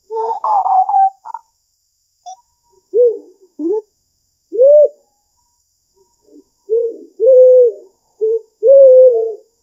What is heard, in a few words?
realistic fox, yip